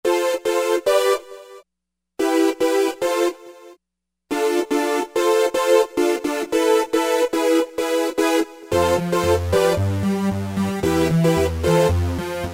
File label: 4 x Saws; Roland SRX-05 Supreme Dance